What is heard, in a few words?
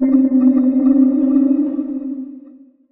LEAD (C), Pedal One Shots; Disco; Cymatics; ANALOG EVOLUTION Beta